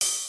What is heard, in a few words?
ride